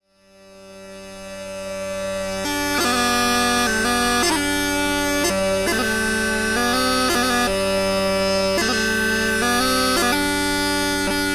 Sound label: Small Pipe Set